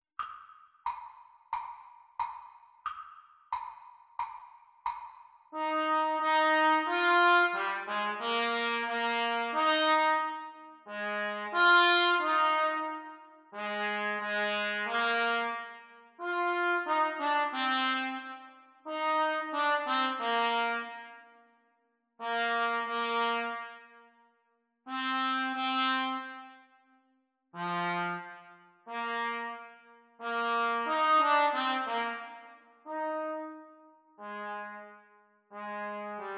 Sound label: Trumpet Duets Sea Song; Grade 3; Exam Speed; Cornet Duets